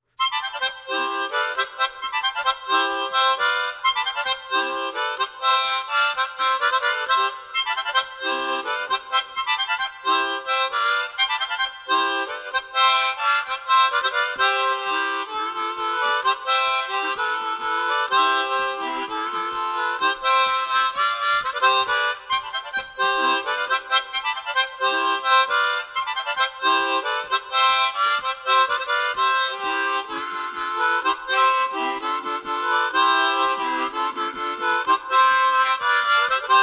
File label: Happy Harmonica; instrumental; Boon